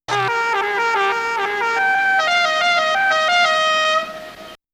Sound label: bad radio horn